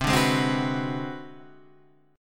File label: G sharp 7th Sharp 9th Flat 5th